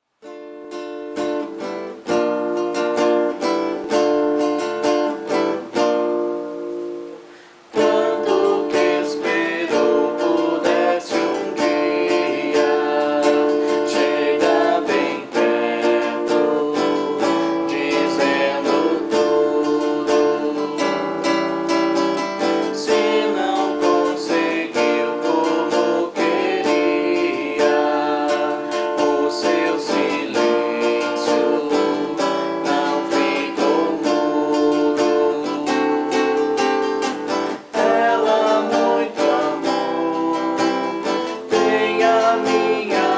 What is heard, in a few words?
vocal